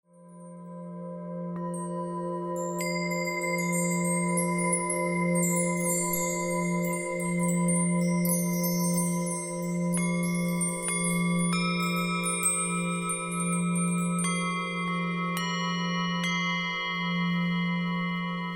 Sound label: tao bells